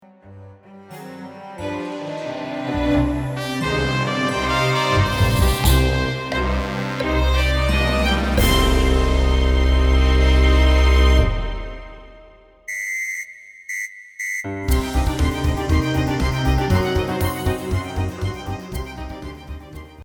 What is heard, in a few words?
Instrumental